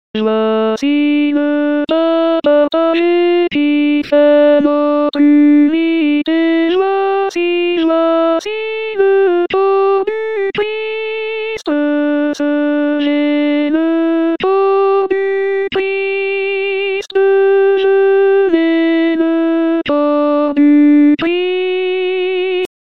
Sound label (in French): Voici le pain partage Alto, Chorale